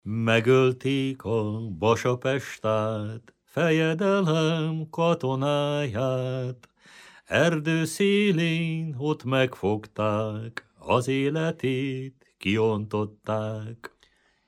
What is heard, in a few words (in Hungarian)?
nepdal